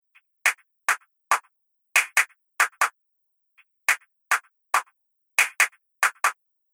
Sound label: 140 Bpm Techno Elements; Percussive And Rhythmic; Seamless loop